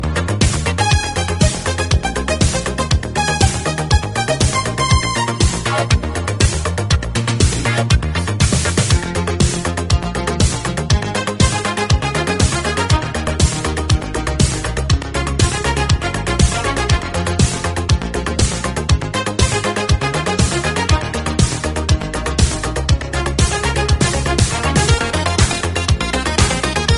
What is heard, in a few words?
digital sounds